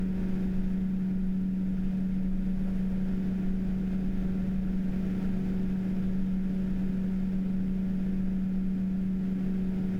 Max RPM

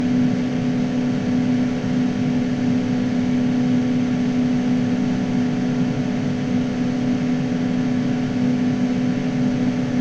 Max RPM dBa

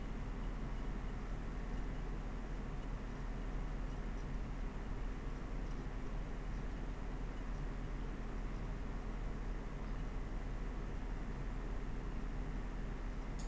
Torture Noise

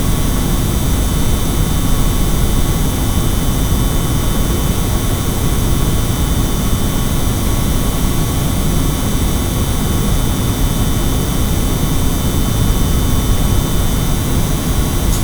Coils

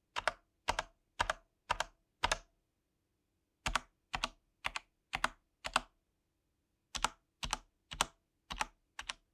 Thock Leer Enter Back

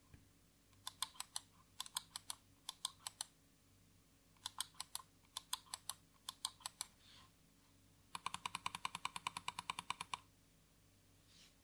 Shoulder Profile; LIFT2 SYMM

